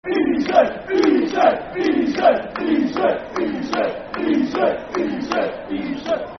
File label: Easy, Easy, Easy, Easy, Football Chant (Soccer Song); Brighton Bhafc